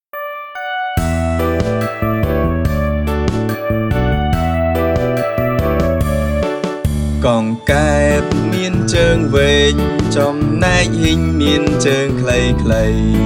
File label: My Frog Bullfrog and Toad; កង្កែប-ហ៊ីង-គីង្គក់